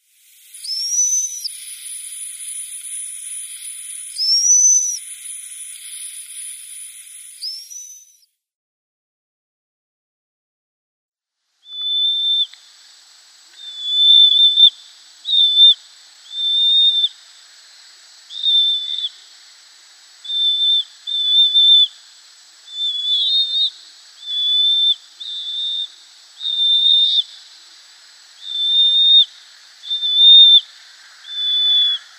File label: Pygmy Owl downy Young